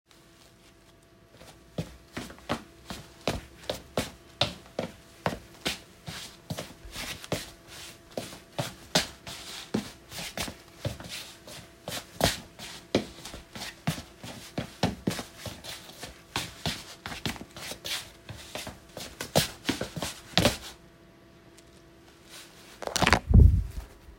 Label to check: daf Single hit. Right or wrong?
Wrong